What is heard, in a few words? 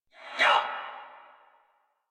Attack Effort; Warframe; Dax; Ranged